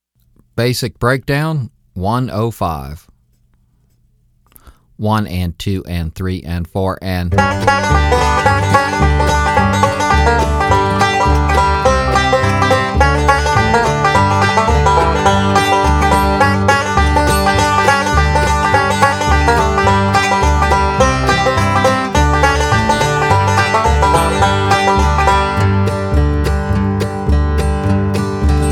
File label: Basic Breakdown 105bpm